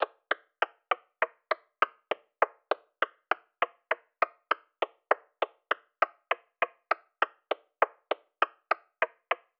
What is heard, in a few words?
Percussion Loop - 100 BPM; Countdown